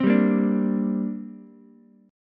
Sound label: C 7th Suspended 2nd C down Ukulele; C7sus2 strummed